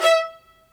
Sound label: MARCVLN B3; AKAI S-Series CD-ROM Sound Library VOL-2